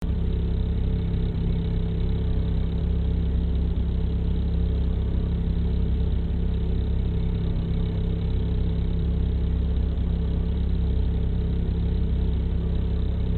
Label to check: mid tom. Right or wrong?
Wrong